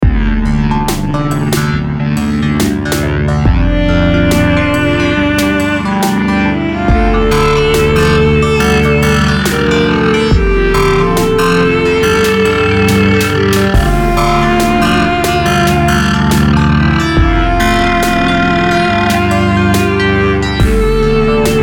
electro viola

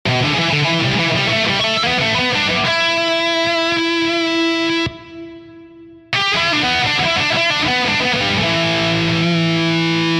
solo

